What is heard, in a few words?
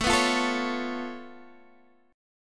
C sharp Augmented 7th A, chord